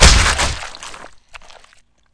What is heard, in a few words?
body splat; Benny Placeholders